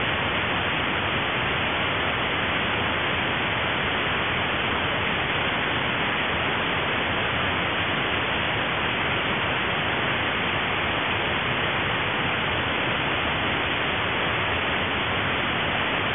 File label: galactic background